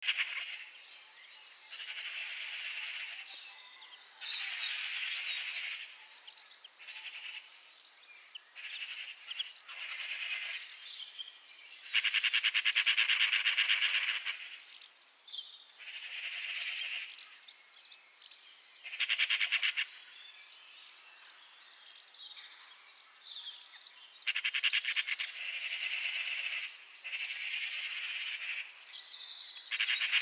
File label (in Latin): Pica pica; SETE